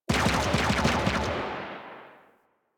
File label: Fire C; The New War; New War Intro Moon; Rail Jack